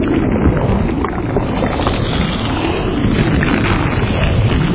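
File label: lava noisy active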